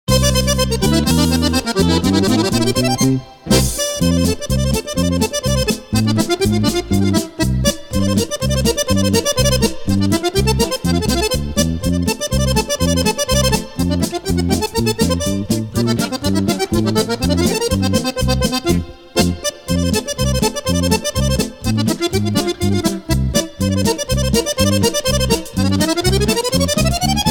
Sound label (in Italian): suona la fisarmonica